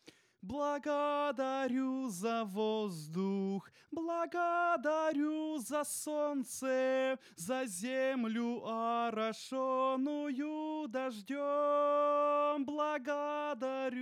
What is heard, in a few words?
vocal